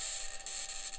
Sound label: mic, mars2020 edlcam